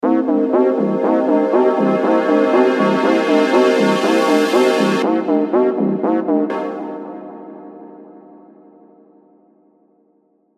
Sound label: synth swirl